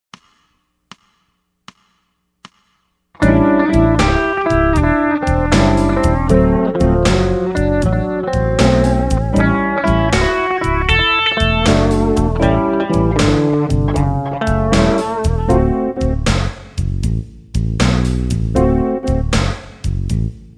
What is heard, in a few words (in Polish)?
podklad do cwiczen improwizacji